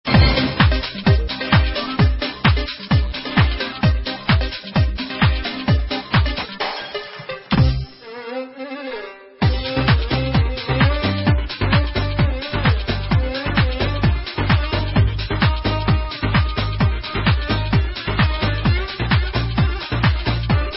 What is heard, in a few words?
Debka Turkit